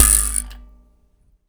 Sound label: Finger Bass; Battery 3 Sample Kits